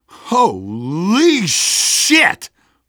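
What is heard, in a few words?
sawmill explosion; hl2; ep2; outland 12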